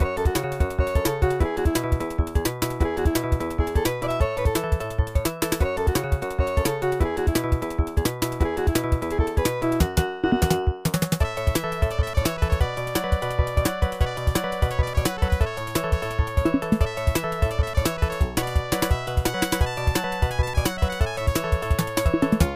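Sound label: background music; Block Block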